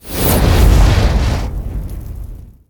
Flame Breath; Evolve; Goliath

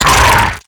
Exertion Light; Evolve; Gorgon

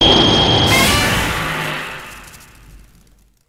Heat Ray + Roar; Shin Godzilla

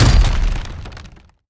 strider step; Strider Remake

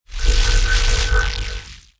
Glide; Evolve; Kraken

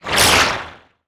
Teleporter Attack Slash; Evolve; Wraith

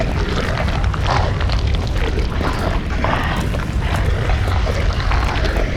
Tongue Grab Aim; Evolve; Behemoth

